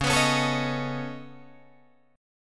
Db 7th Suspended 2nd Sharp 5th Db down Guitar Drop D